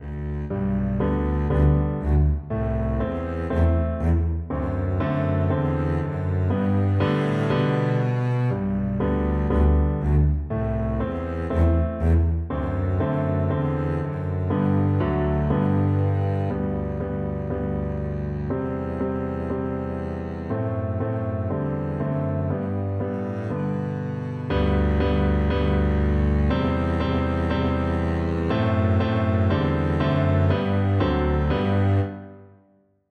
little brown jug, Double Bass

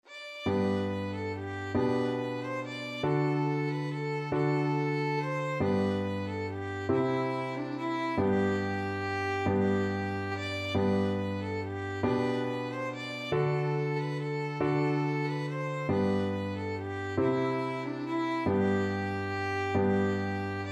south wind, violin

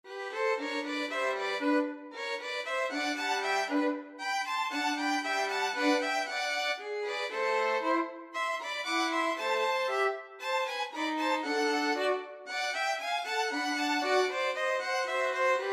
violin trio

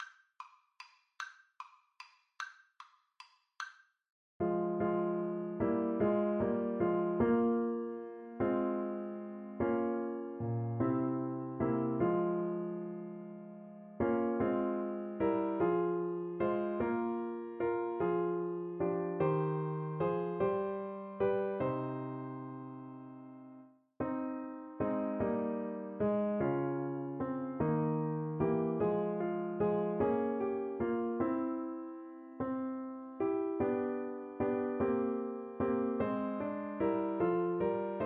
French Horn

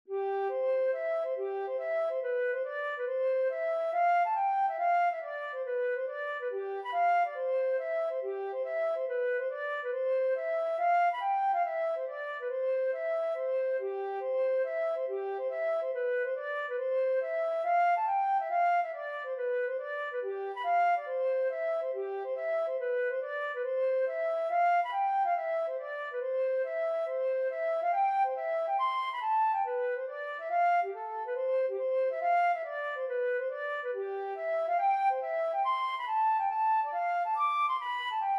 hornpipe, flute

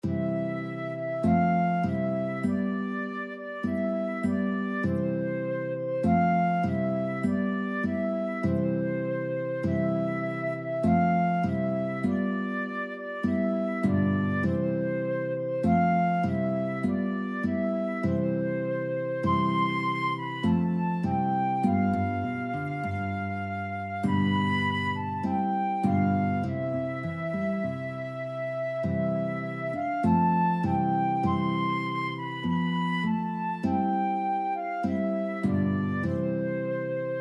all to jesus, flute guitar duet

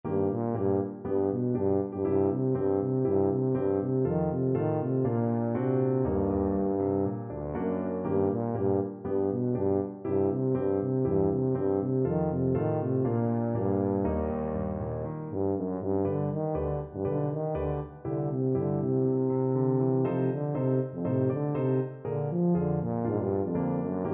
tuba

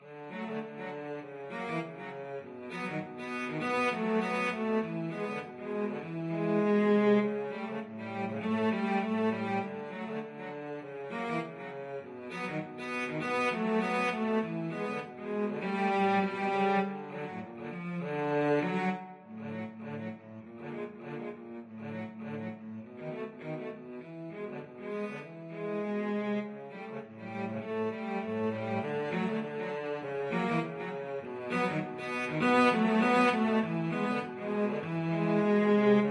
rag, cello duet

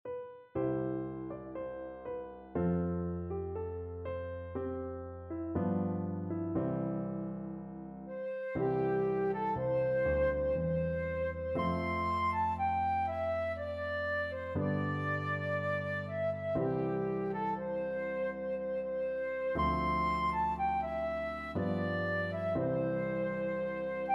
red red rose, flute

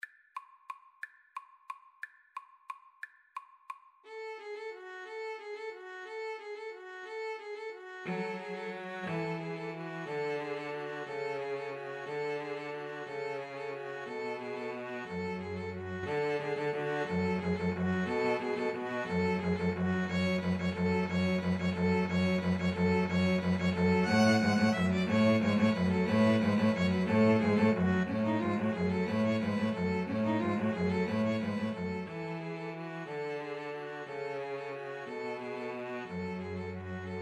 carol of the bells, String trio

